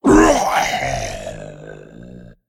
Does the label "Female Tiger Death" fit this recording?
Yes